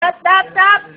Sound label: tap tap tap